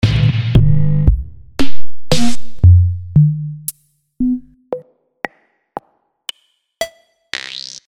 Drums Electro; Partner

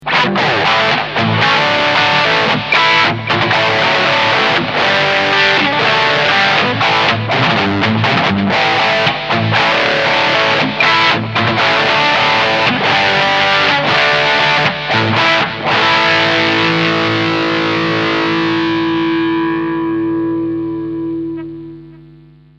Heavy Crunch Delay

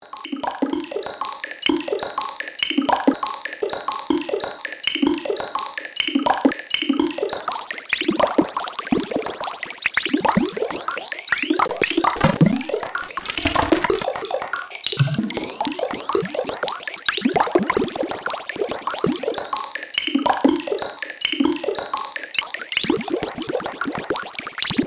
Rhythmic Dots LFO Moduliert; Sonicware Texture Lab

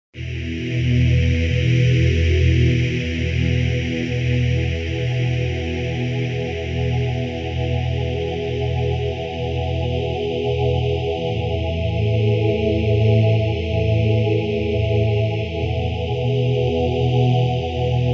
Cybervox IiiOoh Morph

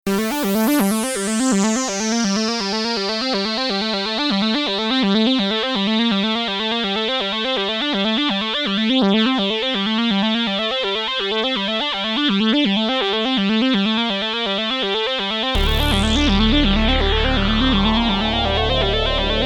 REV2

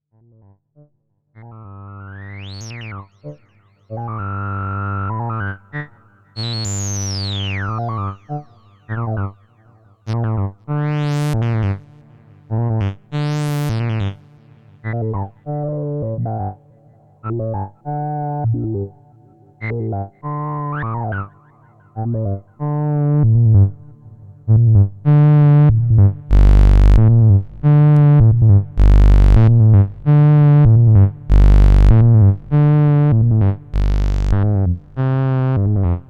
pulse live sequ

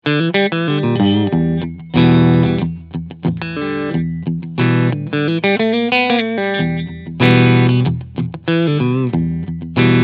TE 69TL Hot Rod NT Roasted Clean Sound beide PUs; Harley Benton

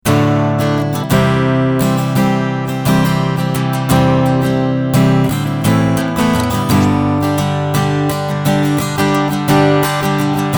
CoolTube strumming

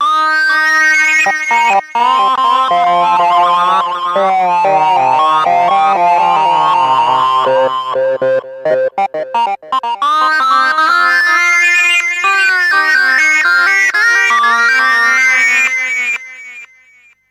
Sync Lead, tc electronic Flashback X4

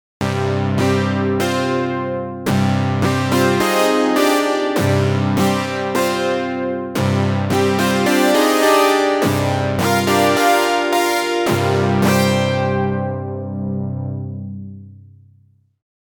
MiniMoog

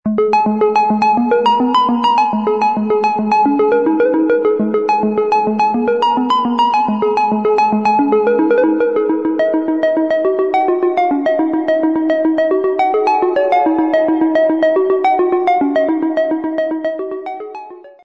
sh09 puls

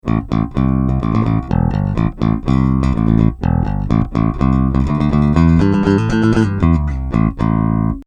Bass clean active

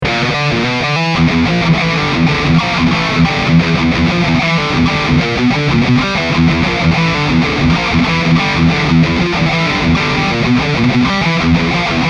Joyo GEM BOX III Preset Power Core

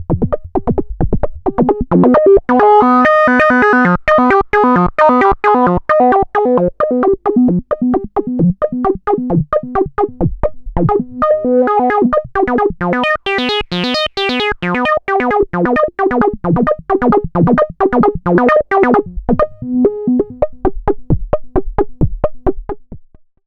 Liquid Foam Loop; HerbsAndStones

